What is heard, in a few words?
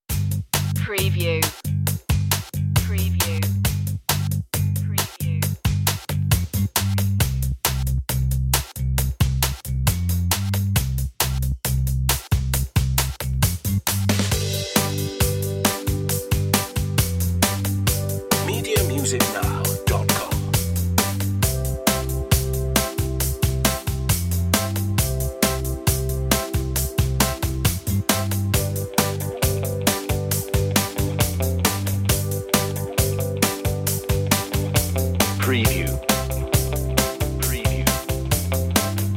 gentle beat; 60secs edit